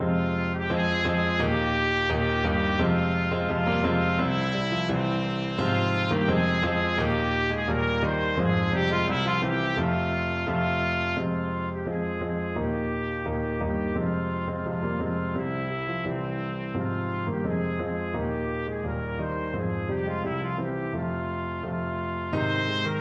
bransle torche, trumpet

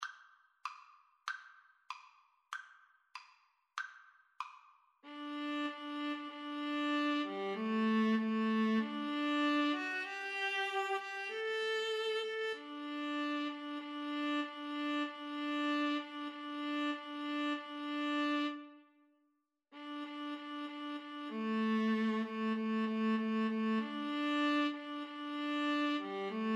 Violin Viola Duet

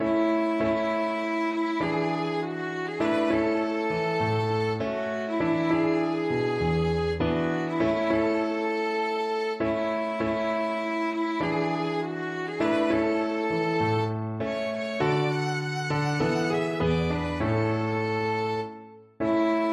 violin

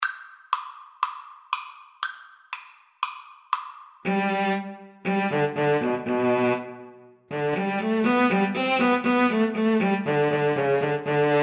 mozart, Viola Cello Duet; k487 no5

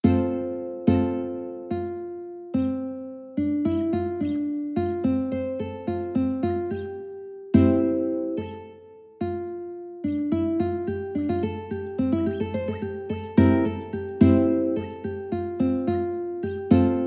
UKE

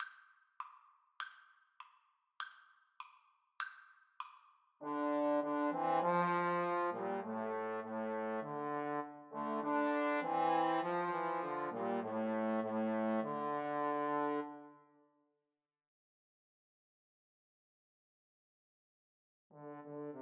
crown roses, Trombone Trio

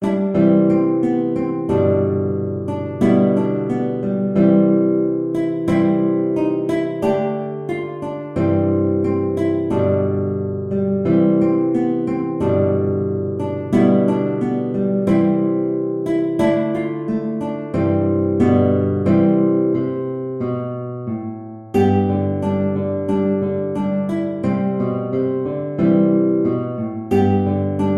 guitar

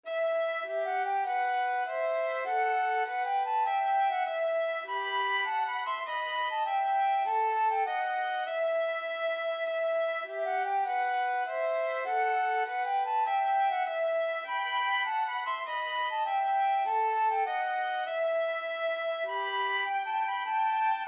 flute violin duet

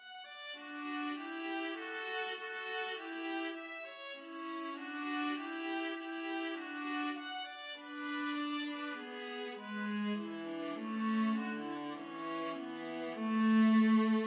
minuet, viola duet

